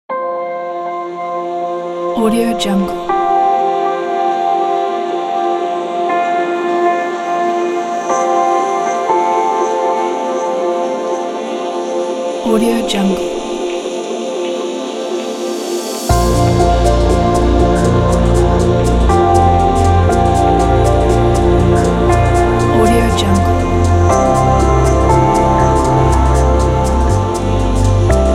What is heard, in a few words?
electronic soundscape